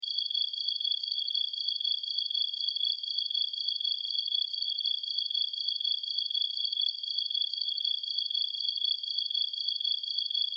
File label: Amb Night Insects, Ambience, Insects, Chirps, Night, Forest, Bugs; Forests And Jungle; Backgrounds; Sound Effects:Backgrounds; Blastwave